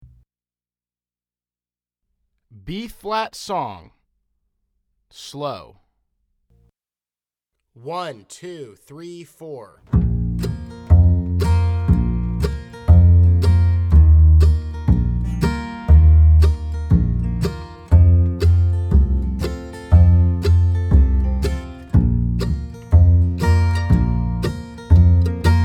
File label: Bb Song Slow